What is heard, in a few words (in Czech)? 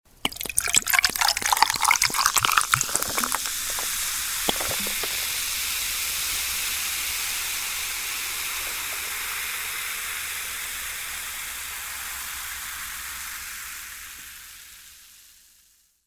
nalevani sektu